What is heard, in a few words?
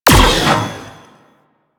Space Fighter Coil Agent Fire; Warframe; Enemy Ships; Space Fighter Sentient Coil Agent